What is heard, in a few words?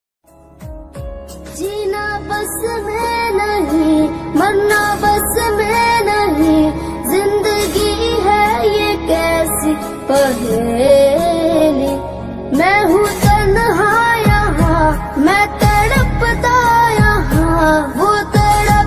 love ringtone sad ringtone feeling ringtone; punjabi ringtone